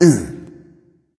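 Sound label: non-verbal tongue; smite; voicepack; houyi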